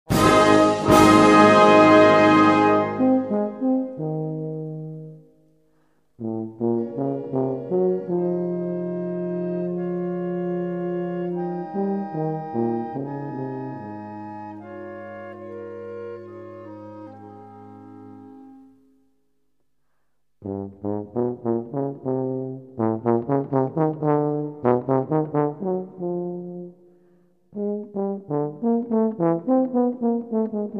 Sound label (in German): Blasmusik symphonisch